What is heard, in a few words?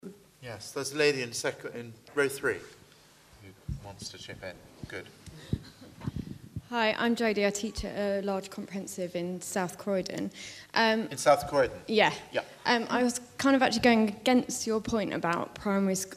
Audience Response